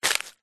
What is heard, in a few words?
foley footstep single shoe dead palm leaves; zapsplat